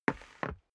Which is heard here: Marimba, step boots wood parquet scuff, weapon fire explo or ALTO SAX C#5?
step boots wood parquet scuff